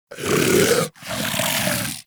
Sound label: Vocal Breathe Slow; Warframe; Hunting Critters; Vulpaphyla